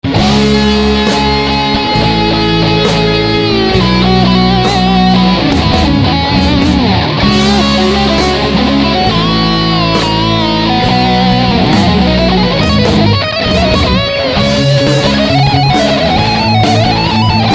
solo

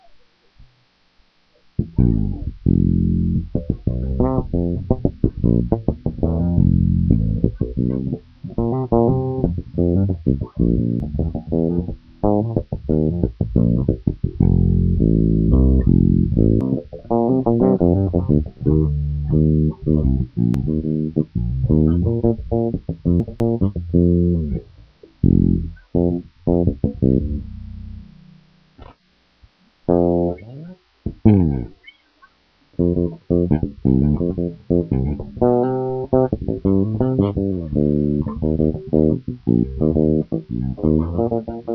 baixo

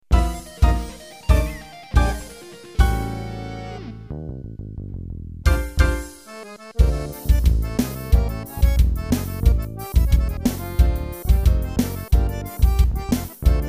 forró

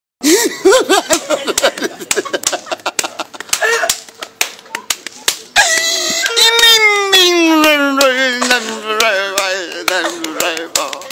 velho dando risada

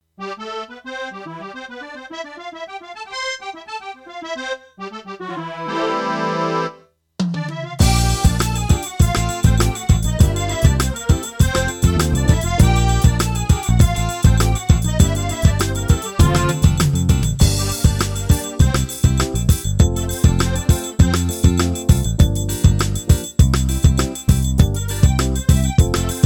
amostra forro

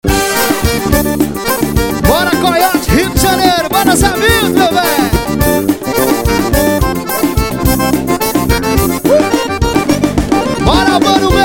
forro pra sacudir

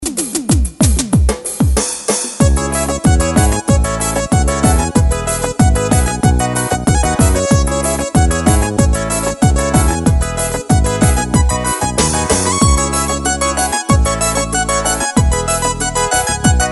ritmos yamaha forró forró dance

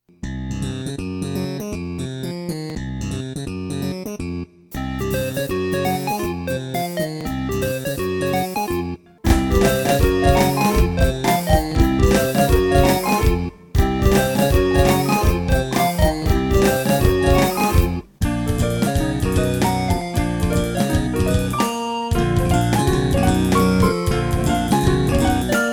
soul progressivo

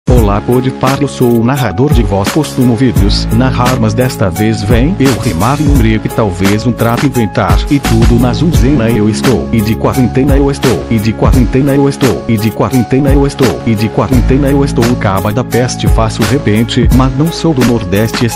rap

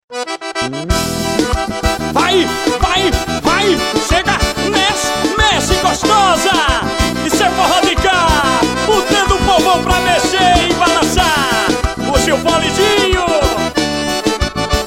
forró

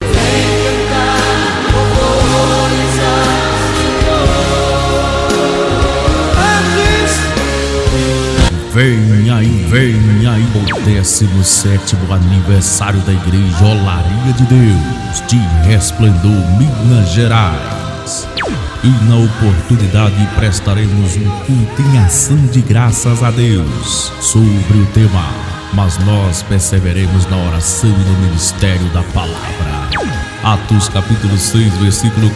canta louvor

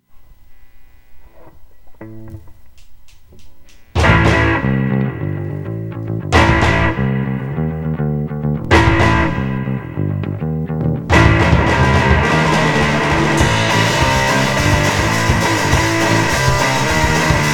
musica

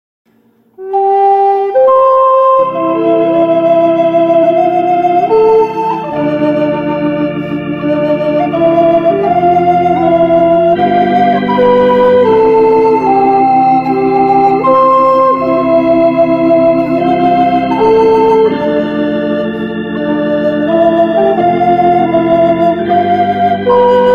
flautas

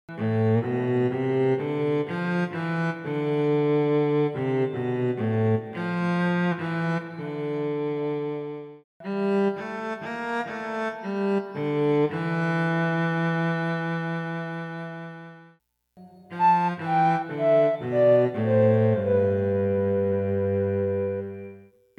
trilha violoncelo; trilhas para filmes

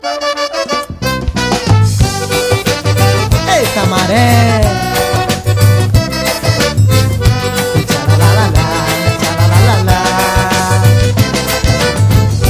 forro

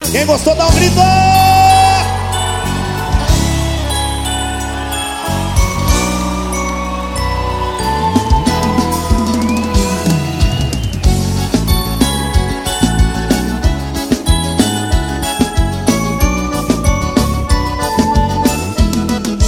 forró

